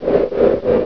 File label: polearm spin